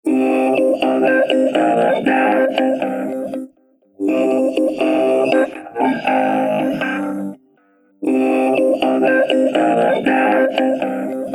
Rhodes Piano

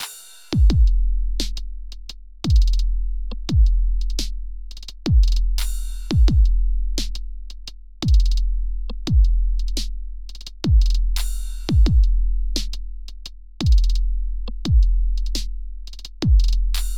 Drumazone2 MultiExpander Bass Body; Metric Halo v4

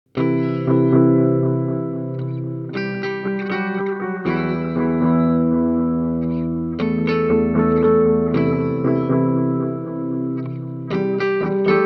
Boss DM 101 Multi Head

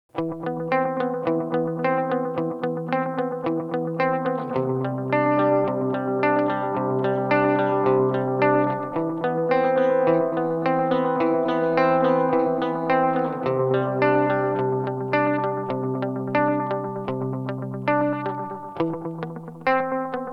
Resonant; Source Audio Nemesis

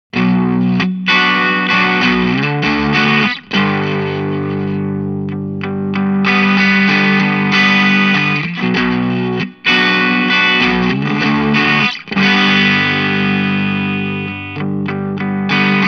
Boss ME-90 Tweed

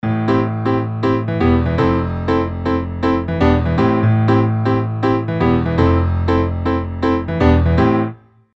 EZkeys 2 House

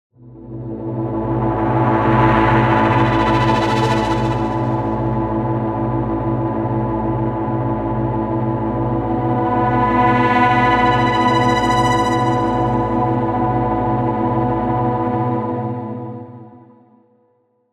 Fluttering Follower; Cherry Audio PS-3300

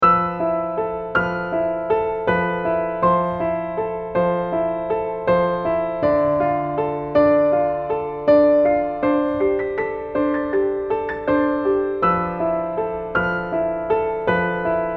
Boesendorfer

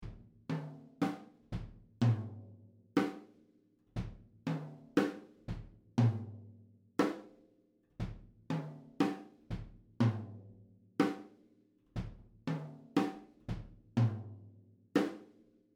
Overheads; Zoom TAC 2R

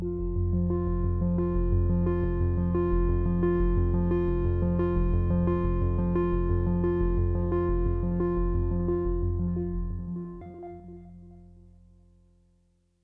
Polyend 12 Bokeh seq

